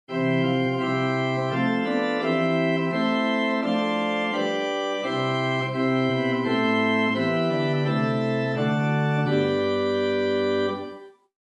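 Swell Rohrflute 8 + Gemshorn 4 + Principal 2 + Mixture; First Baptist Riverside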